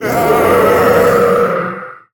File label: Monsters Twin Head Death